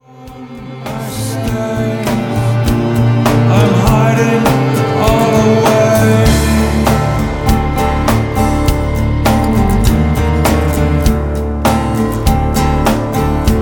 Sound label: Acoustic Version